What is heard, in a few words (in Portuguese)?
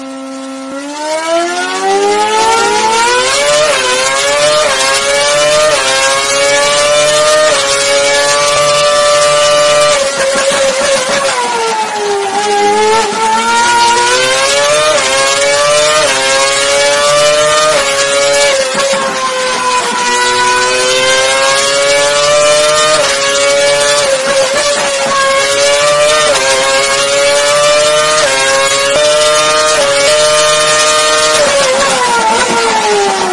som motor f1